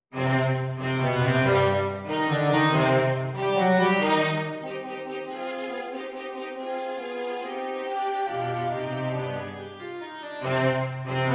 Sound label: Quartet